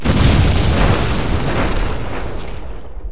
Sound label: boom F16; Falcon 40